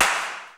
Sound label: rim, Roland MC-909